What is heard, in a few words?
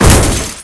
Car Crash; Nicktoons Nitro; arcade